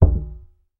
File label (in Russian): zvuki bochki